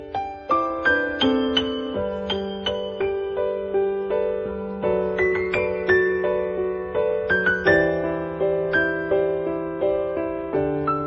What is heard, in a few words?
romantic love beats